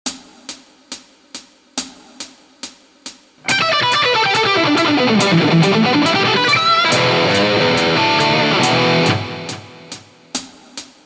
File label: Lickbag, Guitar; Guitar Techniques 2019 Issue 297 July